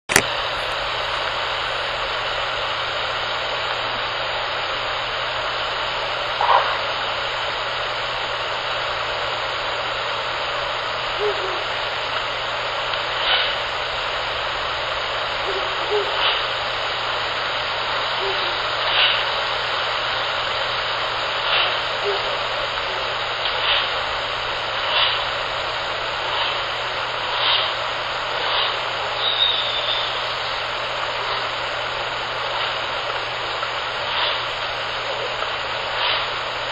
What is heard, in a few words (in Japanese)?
フクロウ